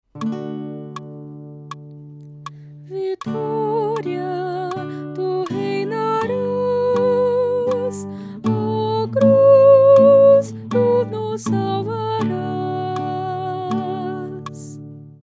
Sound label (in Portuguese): Melodia